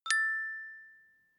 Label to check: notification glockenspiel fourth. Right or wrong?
Right